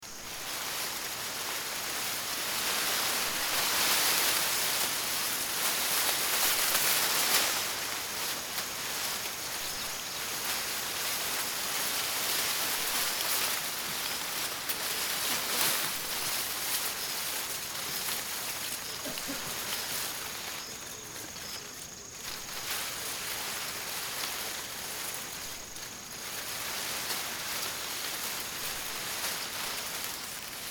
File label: bamboo leaves